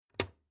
Household Old Wooden Writing Cabinet Large Chest Draw Handle Released; Tom Hutchings